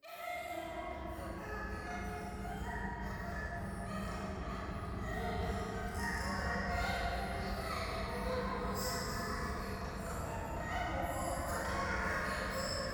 廃墟 喘ぎ 電車

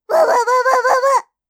システムエラー

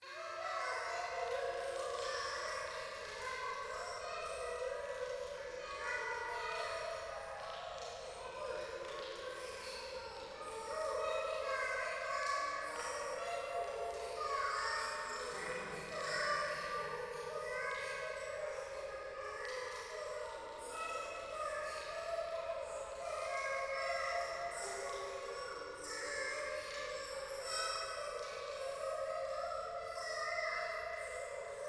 廃墟 可愛い喘ぎ 暖炉

